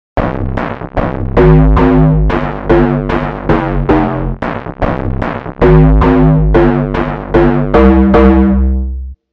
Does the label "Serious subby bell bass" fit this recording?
Yes